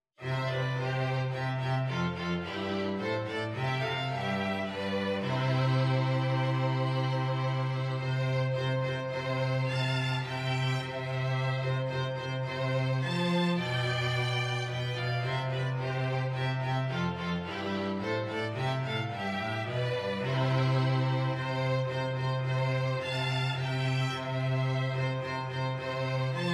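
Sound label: The Holly and the Ivy violin violin cello; Christmas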